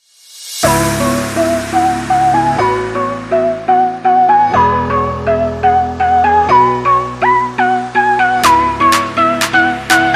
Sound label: dance mood